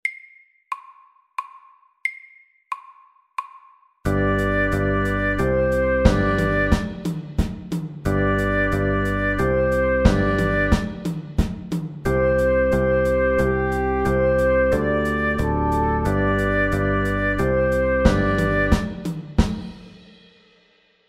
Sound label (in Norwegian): Fusion SAKTE